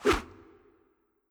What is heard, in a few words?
Flying Dagger